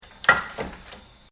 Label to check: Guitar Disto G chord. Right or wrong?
Wrong